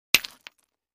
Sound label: Brick Hit Hammer